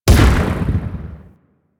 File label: Swarm Missile Explosion; DE; Warframe; Weapons; Sentinel; Helstrum